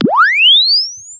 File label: Press Two Sides Chirp; Samsung 4 Recordings